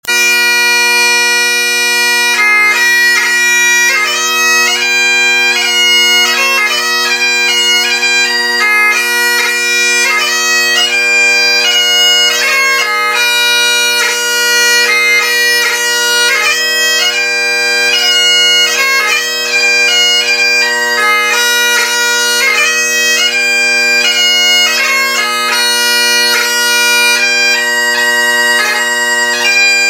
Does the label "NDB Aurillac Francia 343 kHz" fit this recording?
No